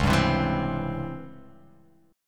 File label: C sharp Minor Major 7th Flat 5th; strummed; x,4,5,5,4,7,x,x